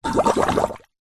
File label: water in metal container short bubble blow hard airy through plastic; foley; flexi tube